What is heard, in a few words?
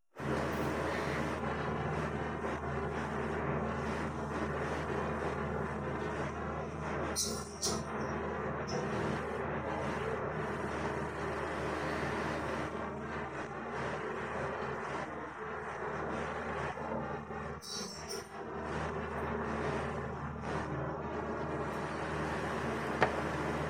no vocals; htdemucs 6s